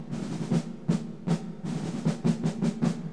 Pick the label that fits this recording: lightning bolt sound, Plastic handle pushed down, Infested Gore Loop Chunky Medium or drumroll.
drumroll